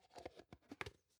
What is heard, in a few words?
plastic can small lid close soft